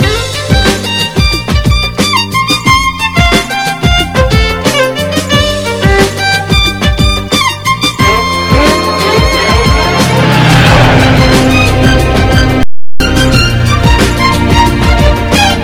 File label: Snake Music